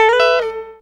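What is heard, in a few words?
FADE IN GUITAR; E-MU Producer Series Vol. 8 – Platinum Phatt; Gtr Chords&Licks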